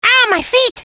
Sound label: Spikez Ow!; Ow, my feet!; Gruntz